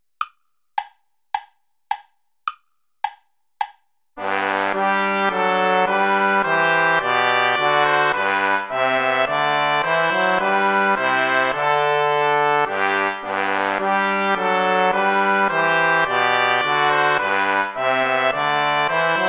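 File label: play along without trombone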